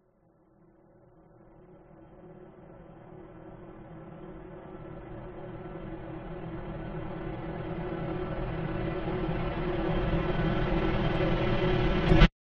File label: cinematic crescend reversed fear tension; sound design